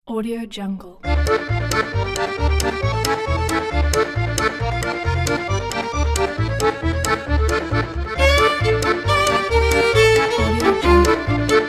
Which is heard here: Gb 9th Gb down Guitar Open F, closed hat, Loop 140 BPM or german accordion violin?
german accordion violin